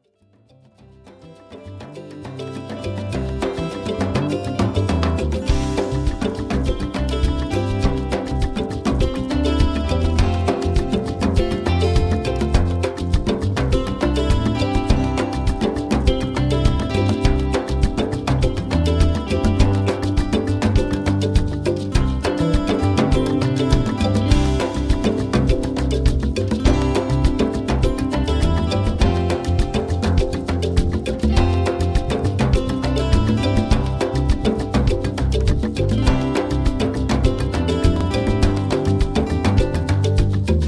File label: Percussion Track